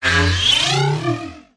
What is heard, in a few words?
science fiction robot transform metallic mechanical